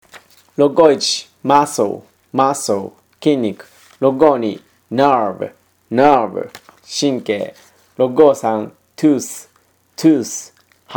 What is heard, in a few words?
tango